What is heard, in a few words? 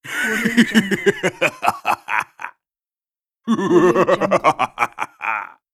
man laugh